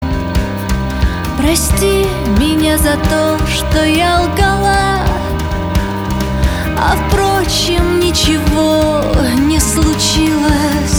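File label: waltz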